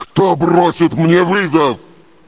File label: hero